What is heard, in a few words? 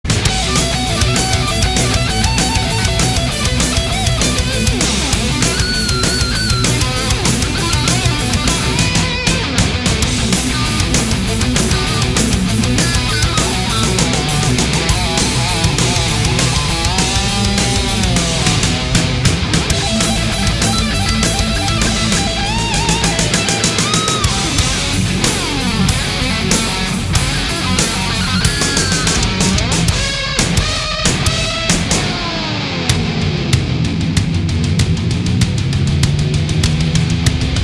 Solo